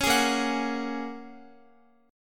Bb Minor 7th Sharp 5th, Guitar; Bbm7#5 strummed

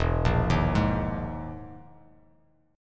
E Major 7th Flat 5th down Bass Standard; EM7b5 arpeggiated; JGuitar

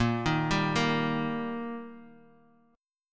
D sharp Major A sharp, chord

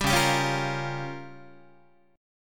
C sharp 6th Flat 5th C sharp down Guitar; strummed; C#6b5; JGuitar

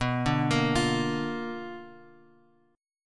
B-Minor Major 7th Flat 5th B down Guitar Open F; arpeggiated

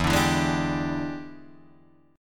E 7th Sharp 9th Guitar Open D, chord

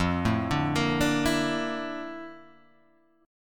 A sharp Major Sharp 11th, F

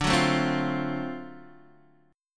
D 7th Sharp 9th D down Guitar Open D

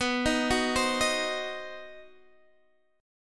C Minor Major 7th Flat 5th B, chord

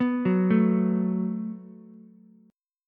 Gb Minor Double Flat 5th, Ukulele; arpeggiated; 4,6,5,x

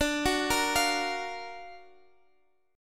Gb Augmented Major 7th D

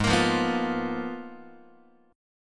G sharp Minor 6th Add 9th, Guitar; strummed; x,4,1,3,3,4; 4A,4E,5A,5D,5G#,6C#

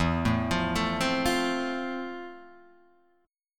F Major, guitar chord

